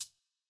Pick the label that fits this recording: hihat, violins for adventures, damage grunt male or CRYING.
hihat